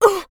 fighting game designed human voice vocalization female